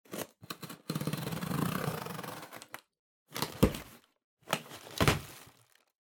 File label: Cardboard Box Unbox; ダンボール; 開封; 梱包材